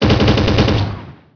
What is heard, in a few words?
gun; hk adp; Emperor - Battle for Dune